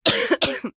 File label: cough